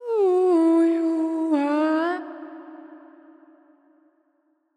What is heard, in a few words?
Oooh, Vocals; GhostHack